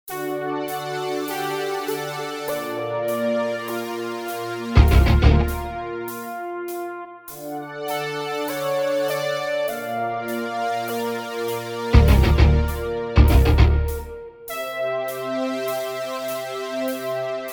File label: peaceful menu music; title music